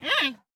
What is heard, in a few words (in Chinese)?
熊猫待机声